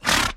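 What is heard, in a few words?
Exhale, horse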